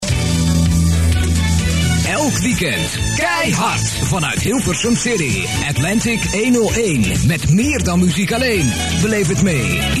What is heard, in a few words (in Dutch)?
Jingle; Atlantic 101 vanuit H'sum City; Nu de Voice-over van SBS6